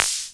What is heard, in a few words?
Electro Percussion, House; Drums